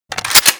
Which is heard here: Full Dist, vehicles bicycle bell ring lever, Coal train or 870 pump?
870 pump